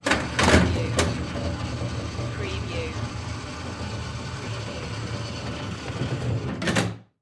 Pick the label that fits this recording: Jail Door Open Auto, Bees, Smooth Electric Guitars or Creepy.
Jail Door Open Auto